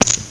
Chips on Chips on Felt; iPoker 2000

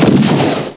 BIG EXP

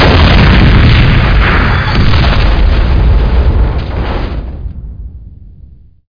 large explosion; SpecOps2MPlayerDemo

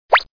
flip; Bible Fun Pack; Education Publishing Concepts

